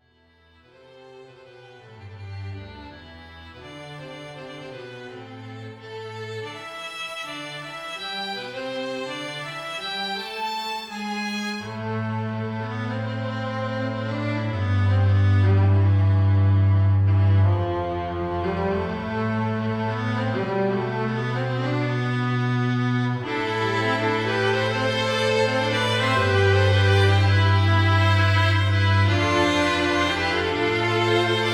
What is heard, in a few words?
Strings